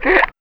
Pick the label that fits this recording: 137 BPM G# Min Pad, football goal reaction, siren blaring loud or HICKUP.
HICKUP